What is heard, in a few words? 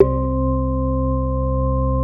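ORGN -L, organ, Drum & Bass, 145 BPM; Ueberschall; Drum & Bass Resonance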